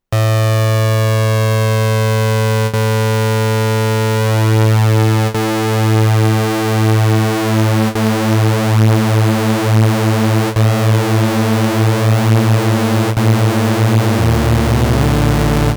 Korg Volca Bass 3-stimmig Pitch Detune

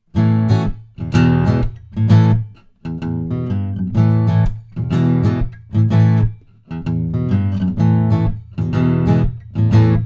Akku R1 stereo denoise

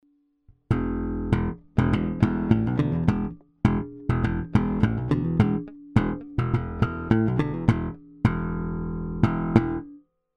E Bass direkt

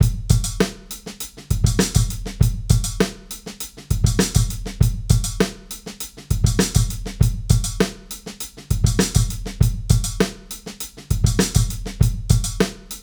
Studio Kit Break mit EQ

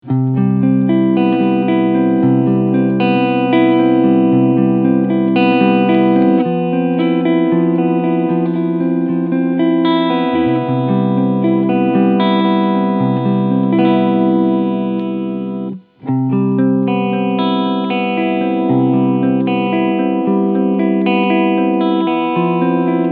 Gibson Les Paul 68 Custom Reissue Alle Cleansounds

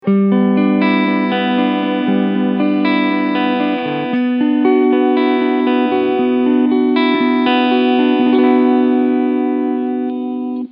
Cleanfahrt Positionen 1-3

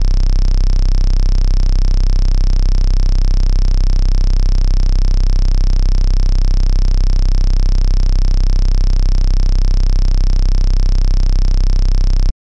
Korg MS20 Tuning nach 2h

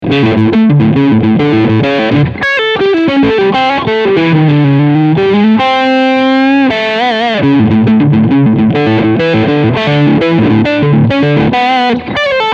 Steg Mitte Crunch

